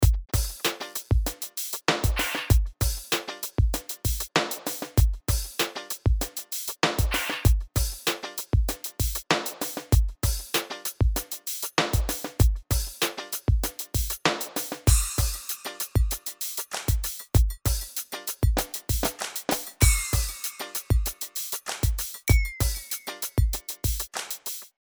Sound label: Punch Film TV